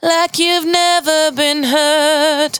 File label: 92bpm Bb, Velvet Vocal; Zero-G